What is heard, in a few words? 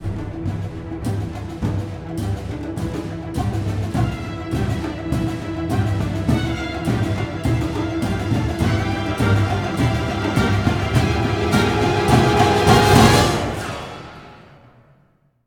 String; The Bureau XCOM Declassified